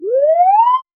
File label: a very soft pad synth